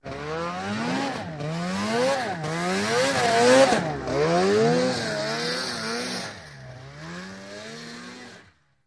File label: Snowmobile; In & By